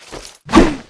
bossmonkey attack; Eft

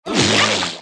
Yeti attack, game; Eft

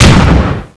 bullet cannon hit

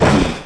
Cow Hit

